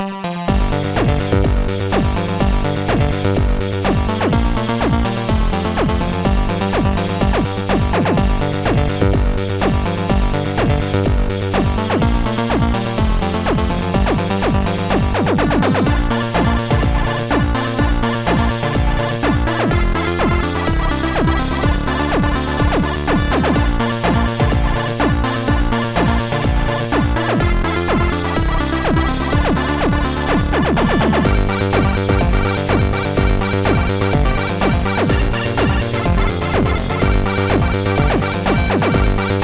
synth corn; QuadraComposer

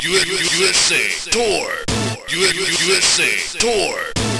beatbox; pinball dreams; Blaizer

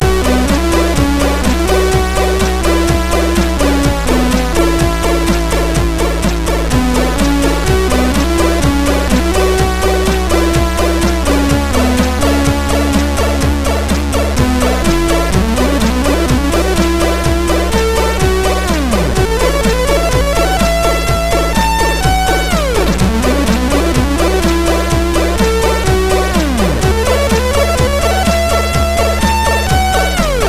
sid MOS6581R3; Sudden Death 02

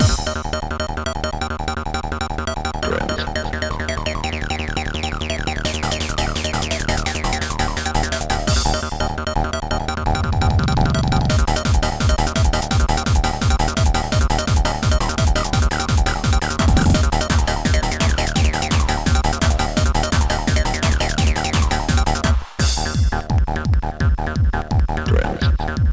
digging 4 trance; Trackers; Modland

